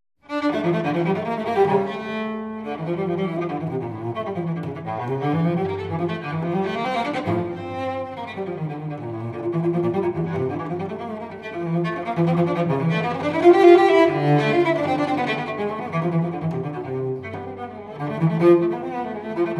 Suite II in re minore courante, violoncello solo; Bach; Sei suites per violoncello solo

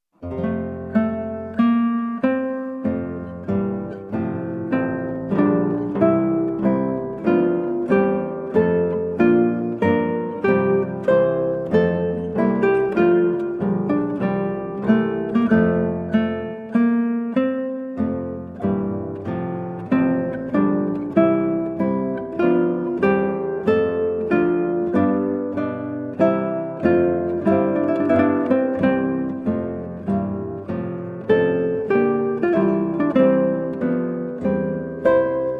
Larghetto from Recorder Sonata in F Major, Guitar; HWV 369; George Frideric Handel

